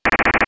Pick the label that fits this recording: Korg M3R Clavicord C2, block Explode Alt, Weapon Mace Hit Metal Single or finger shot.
finger shot